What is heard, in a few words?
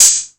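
808 open hi hat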